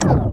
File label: gravity off; cuttherope-holiday